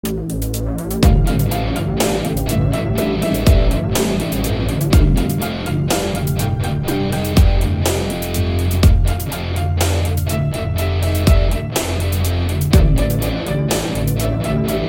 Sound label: Symphonic Metal